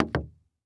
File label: Foley Footstep Hard Sole Shoe Single Step On Solid Wood Platform; Foley Footsteps